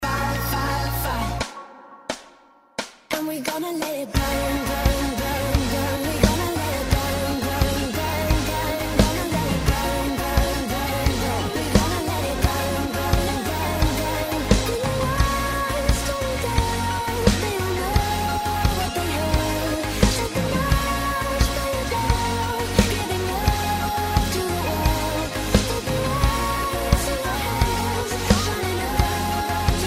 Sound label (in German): Chorus mit Musik